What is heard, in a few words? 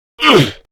Pain, Male; Warframe; Grineer Male; Battle Barks